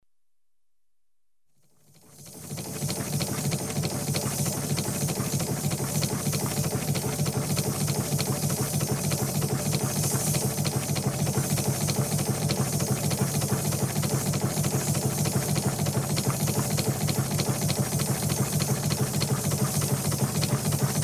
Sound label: engine slows from constant run; The BBC Sound Effects Library